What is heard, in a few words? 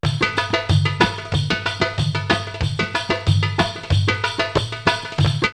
MorroCanCan 92, drumloops World Ethnic; X-Static Goldmine